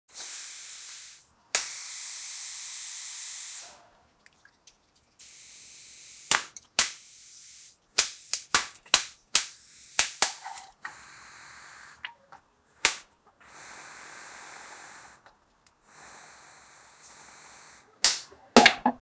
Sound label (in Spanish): pintar splash pintar en e